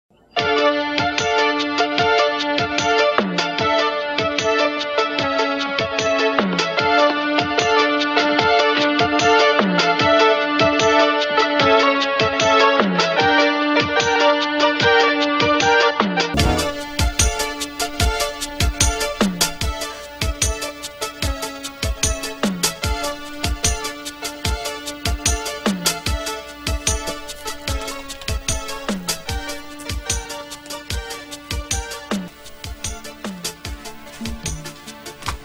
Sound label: dramatic theme